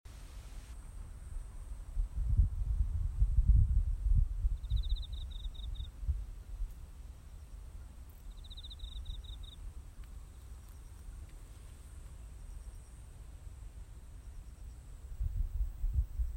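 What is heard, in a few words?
crickets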